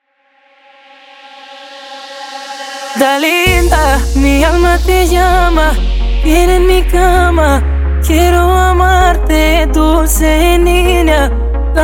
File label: intro outro 130 bpm